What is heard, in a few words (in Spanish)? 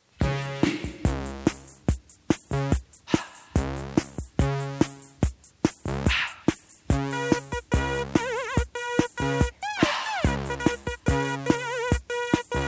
CORTINILLA; DIARIO POP RNE